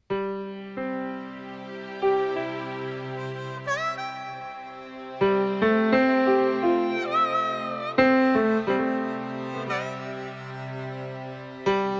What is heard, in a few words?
harmonica mix